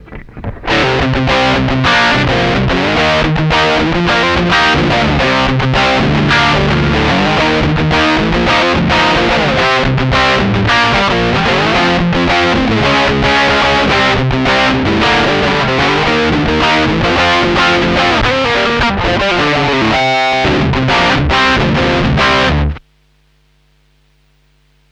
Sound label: Boogie